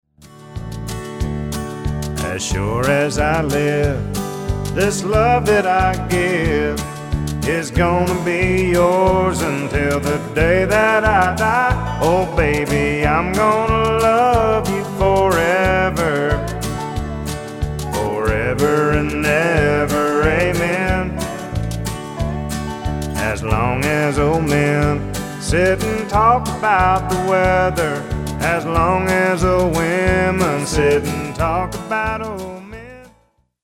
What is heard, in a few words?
Sings